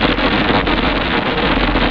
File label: dead signal, ambience